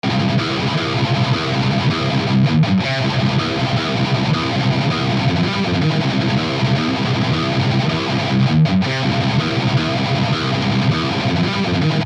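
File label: guitar axe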